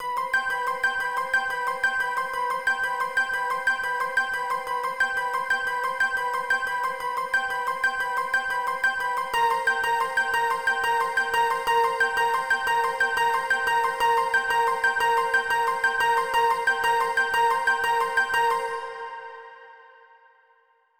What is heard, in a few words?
Pluck Melody; super crush k.o.